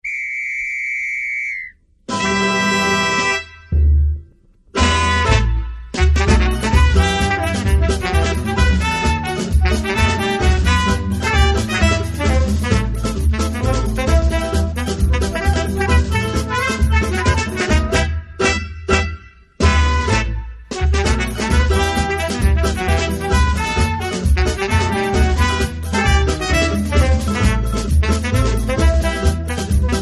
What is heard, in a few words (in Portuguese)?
frevo de bloco; sampler frevo